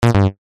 multimedia game sound basic digital retro incorrect error negative